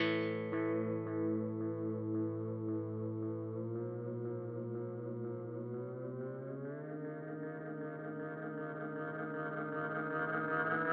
MXR Carbon Copy Oszillation Feedback

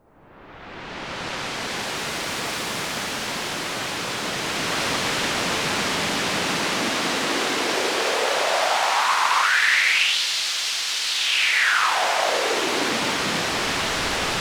VCA gefiltertes Rauschen Feedback Deform

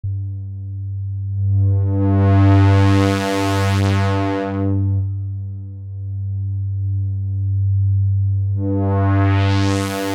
OB-E Filter Vergleich

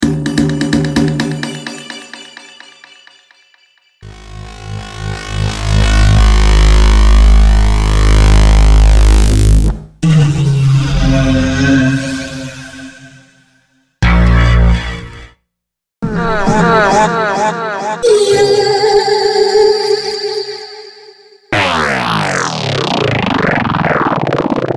Klangbeispiele FX1

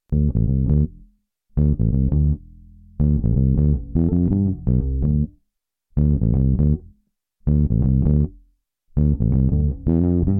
E Bass Drive

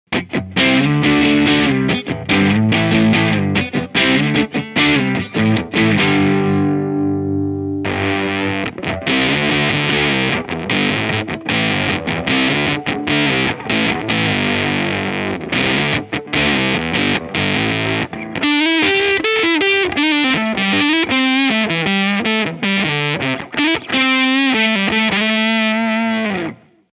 Pepbox wenig Zerre; British Pedal Company

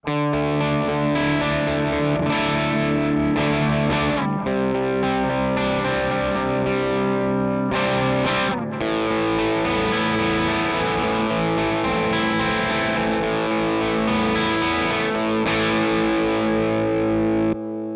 REVV G20 Cabinet Vergleich

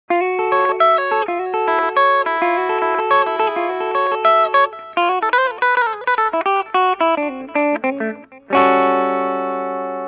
beide pu

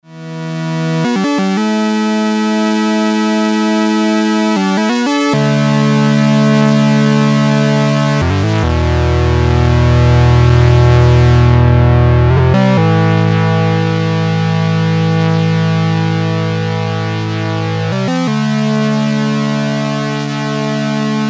3 VCO VCF resonance Vorsicht am Ende

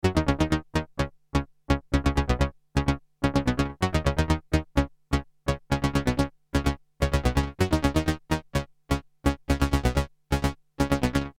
poly d sequenz

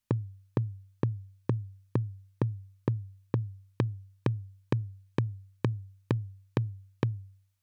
Low Tom ohne dann mit AC

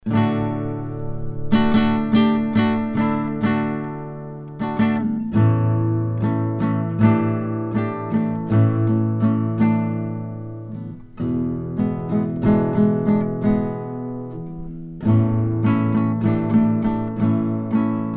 Gitarre clean; NT1 5th XLR

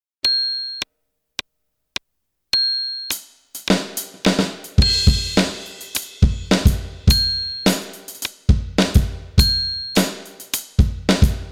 Alesis Turbo Mesh Kit Metronom mit div Kits

